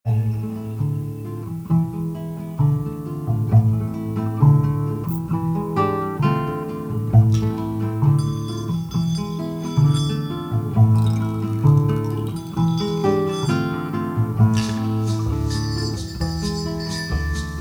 scraper chimes

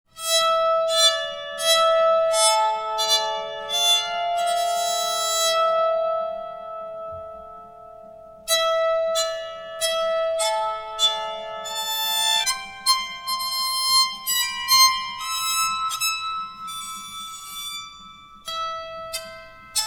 bowed psalt

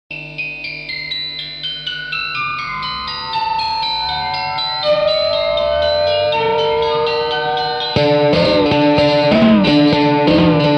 Nice Noise; Crosslander

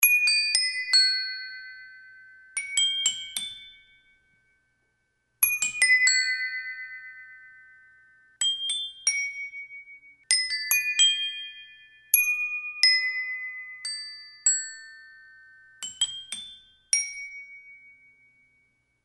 bell tree

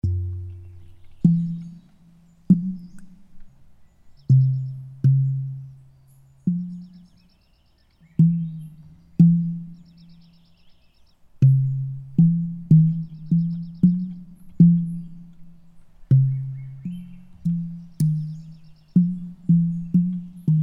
floating bowls

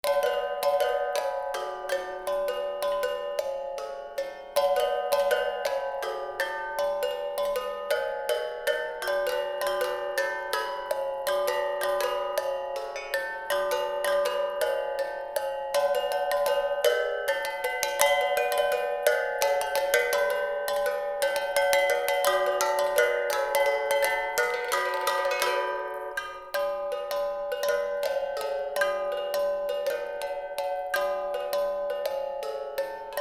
fork bells

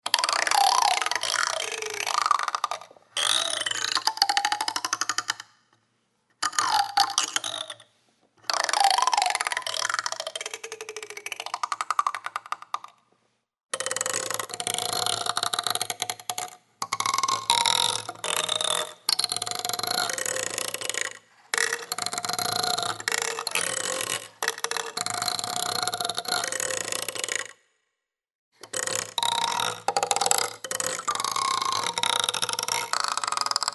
combs

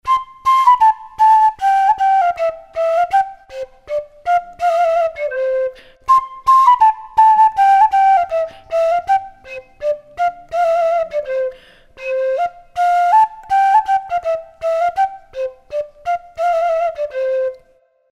Bamboo Flute

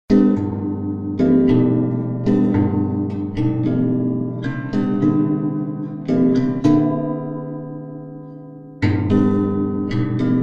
Sticks In Strings

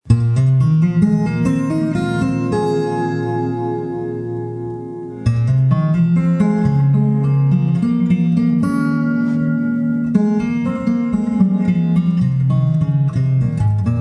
wobble steel

